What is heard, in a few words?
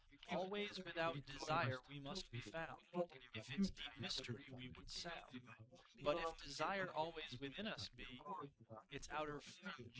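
male, instantaneous mixture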